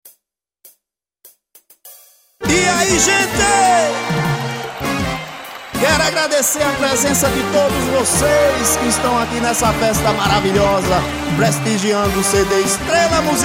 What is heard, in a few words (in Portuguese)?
abertura